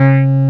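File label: SUSTAIN BASS C3, SYNTHS; OBMX-SYNTHS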